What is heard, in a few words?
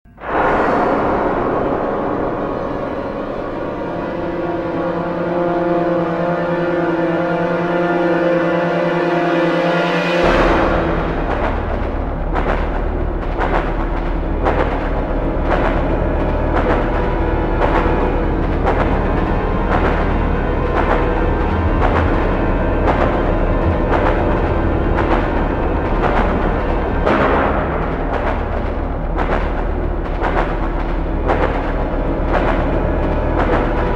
zombie attack; svencoop